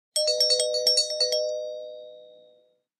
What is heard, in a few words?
Door bell chimes, ding dong